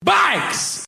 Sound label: bikes